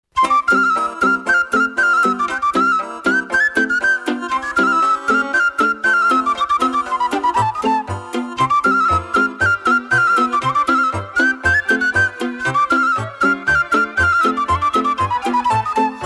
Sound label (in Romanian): instrumentala fluier